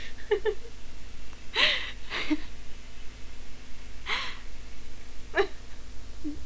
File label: retained laugh